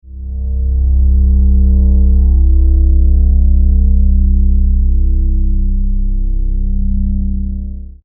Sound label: Basic Drone Loop